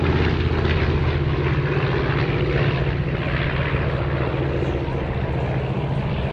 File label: ambient port; World Of Warships; port3d